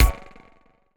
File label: Click Button; MiSide